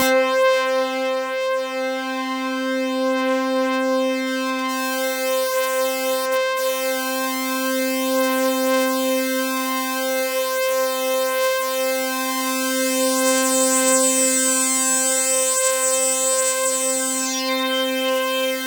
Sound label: POLY SAW, Roland JV 2080, C4